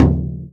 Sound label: BASS, INDIAN PERC